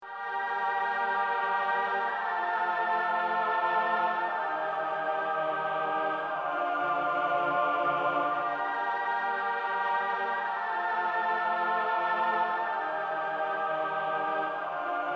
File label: mellotron choir